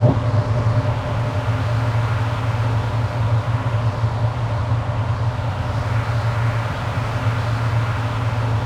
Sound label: ATMOPAD; Best Service; XX Large; Pads